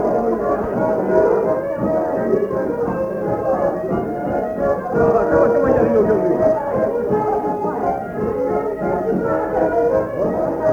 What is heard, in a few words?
mazurka